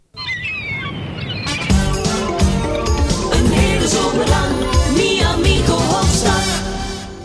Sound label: jingle; Mi Amigo Hofstad